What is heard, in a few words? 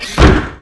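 Footstep; Marisa Kirisame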